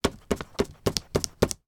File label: office glitter glue dab on paper many times